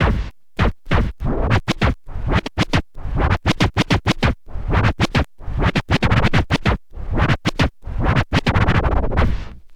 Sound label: scratch med, Vinyl Attack scratches mix